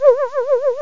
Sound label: ghosty